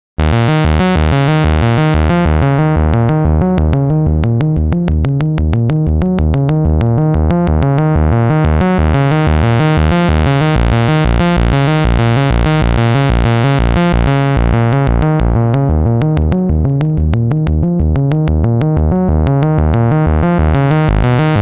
hard filter solo

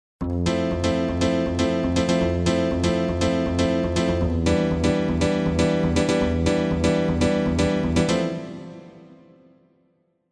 Korg M1 House

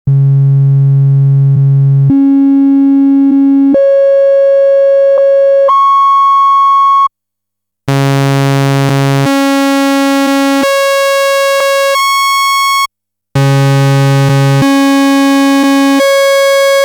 Korg MS-10

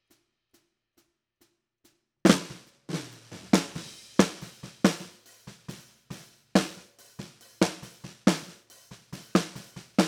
Snare Top Bottom; KI im Studio

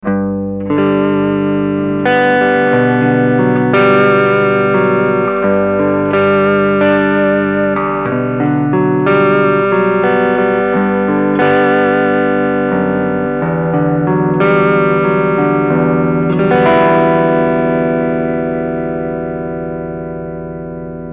Cleansound Midrange Boost

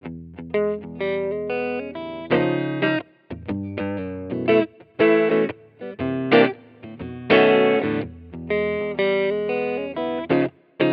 AC30 2x12 Baseman 4x12 Clean; Mooer Cab X2

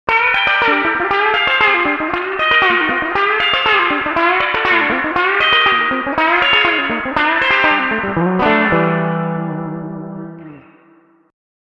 Twang

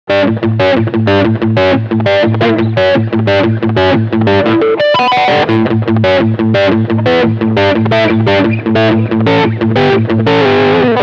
Humbucker Singlecoil mode

